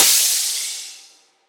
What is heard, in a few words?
Crash